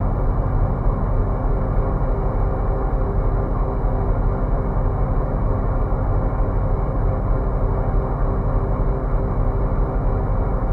Car Hydraulic Brakes

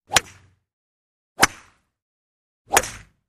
Golf Metal Drivers 3x

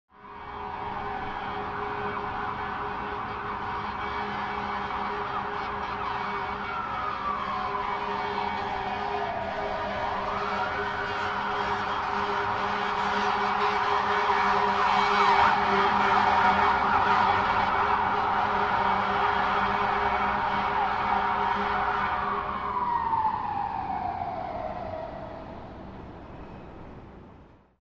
Firetruck Or Ambulan

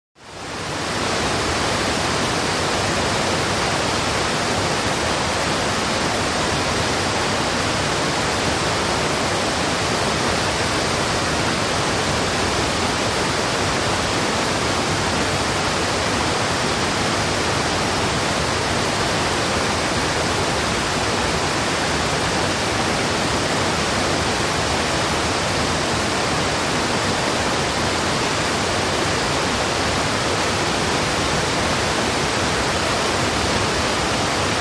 Rapids; Rushing Rapids.; rivers

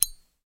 Zippo Lighter Open; lighters & matches

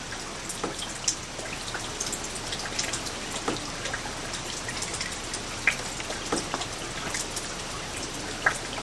Rain Light On Dock

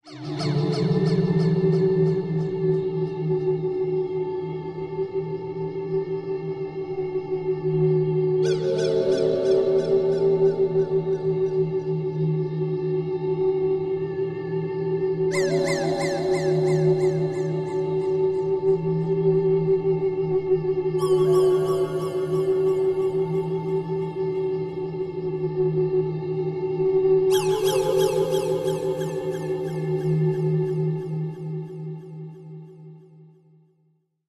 Anti Gravity High Swirl, eerie ambience

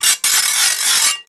Metal Grind Wheel, grinders

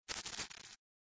Insect Buzz Shrt, wings

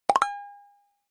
Pop Up Quick Dbl Pop Fo, pop up element

